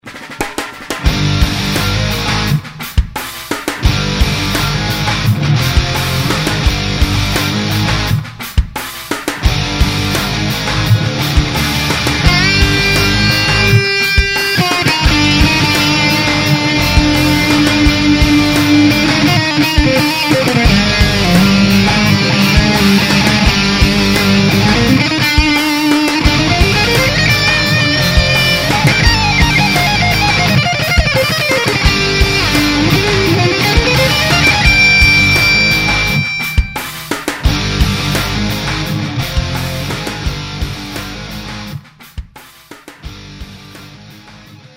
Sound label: bridge higain, guitar